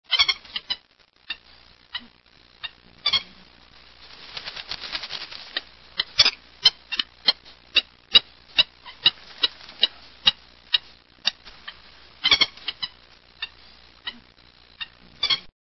Purple Gallinule, songs

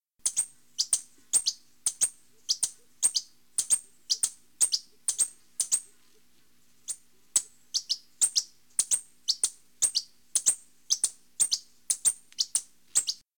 Mexican Violetear

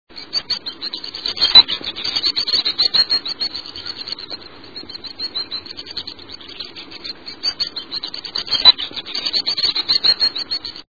White throated Swift

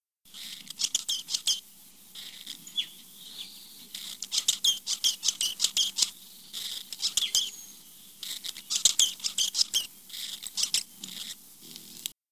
Berylline Hummingbird, songs

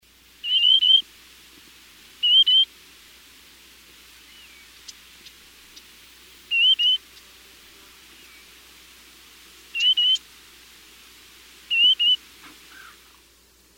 Gray tailed Tattler, songs

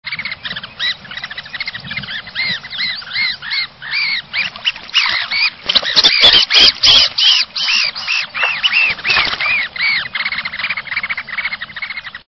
Red Phalarope

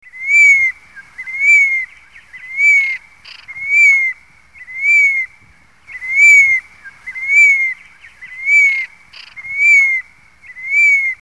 Gray Hawk, songs

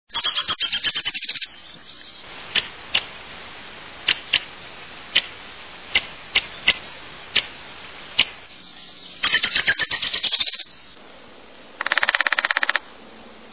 Downy Woodpecker, songs

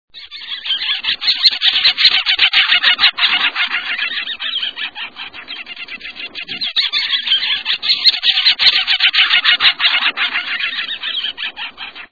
Sanderling

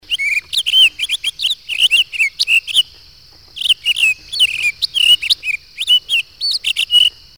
Townsends Solitaire, songs

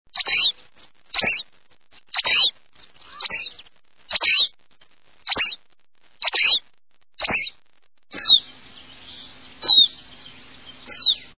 Black Phoebe

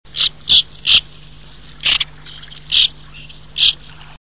White winged Tern

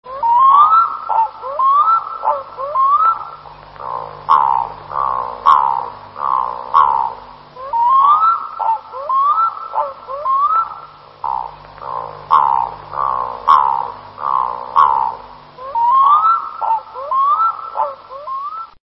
Arctic Loon

